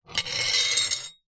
industrial trowel metal scrape on tiled ground

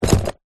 sport body builder belt set down

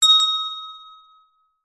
bell small hand ring short

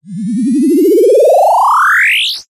cartoon rise grow expand

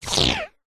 cartoon squash deflate; zapsplat

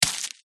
foley footsteps barefoot dirt single

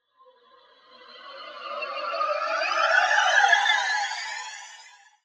fantasy spell mysterious airy

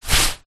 raincoat sleeve arm movement walking single; foley

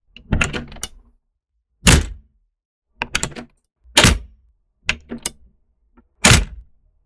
Doors open close; soundbits

